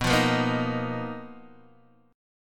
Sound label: B Major 7th Flat 5th B down Guitar DADGAD